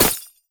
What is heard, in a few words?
Ice Spell Impact Hit Shard